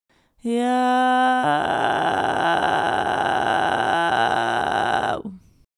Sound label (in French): creaking irregulier; les effets simples